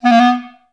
B2, Advanced Orchestra; Best Service

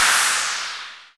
noise fx; Masterbits; Switch FX

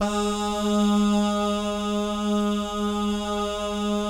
BAH GUY, Vocal Planet Jazz & FX; ILIO; BAHS GUYS

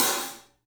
SABIAN HAT; 3D Sound; 3D Drums

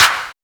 D&B Clap, Drums Hits Claps; neuro

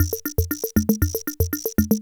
Loop, classic machines, rhythm77

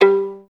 VIS PIZ G2; Best Service; Peter Siedlaczek's Advanced Orchestra

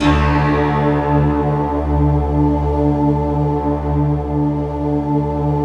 sky choir, Deep Sky; Optical Media International; Sonic Images Library

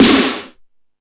Lazer Drums, drum machine

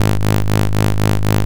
TOOTH CHIS, MACHINES

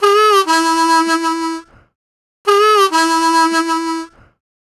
Harm 098bpm A, Harmonica

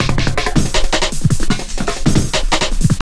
JUNGL 160, JUNGLE LOOP

